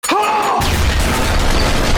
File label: screem